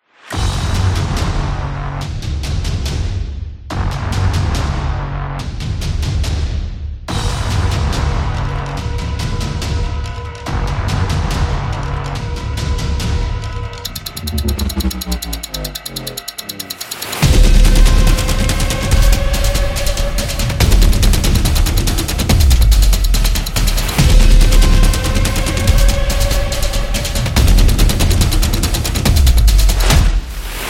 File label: Action Horror Thriller Danger, war drums